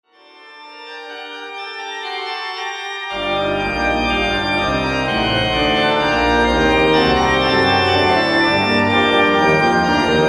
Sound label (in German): plenum schluss fade in; bwv 680